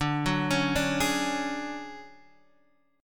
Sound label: D Major 7th Flat 5th Guitar Standard, chord; arpeggiated